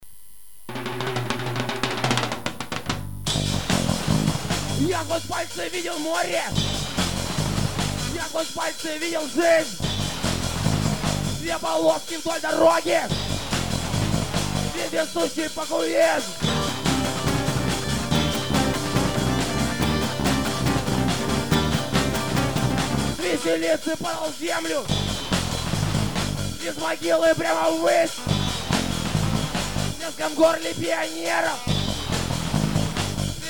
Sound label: jazz